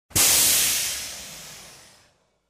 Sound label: Звуки пара Sounds of steam